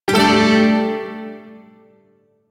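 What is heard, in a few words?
Shawzin Pentatonic Major Chord; Warframe; Duviri Shawzin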